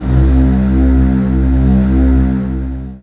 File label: honk looped; RvlSDK